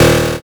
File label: zap